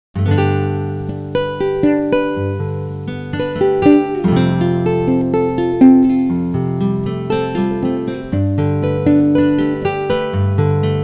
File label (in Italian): suonerie musica jazz